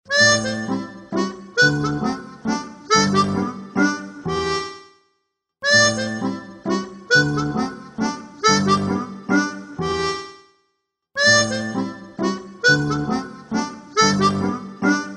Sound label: Nokia Tune